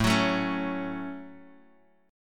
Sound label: A 6th, Guitar, DADGAD; 7,9,7,6,x,x